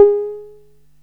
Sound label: SOFT SEQ G#4, Alpha Dance; Best Service Alpha Dance vol.2; SOFT SEQ.2